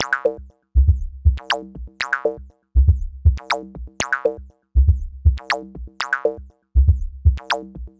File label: Synth